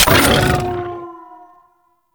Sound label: Quad Sniper Mech; Warframe; Weapons; Tenno